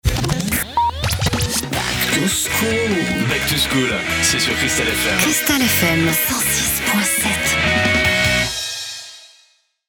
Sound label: Back to School Jingle